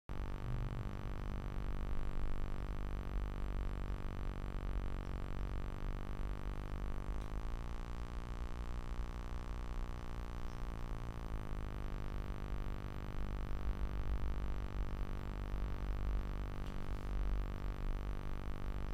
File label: buzzing sound; bluebird mic